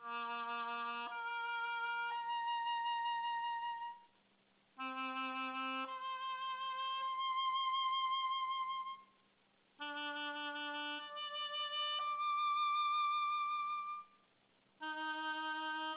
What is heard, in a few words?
octaves